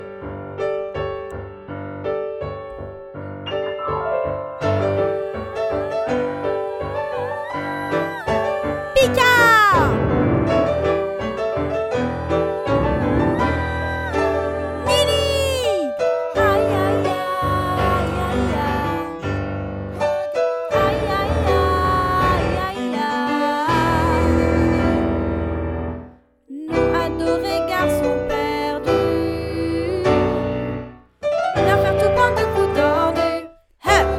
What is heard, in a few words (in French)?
indiens alto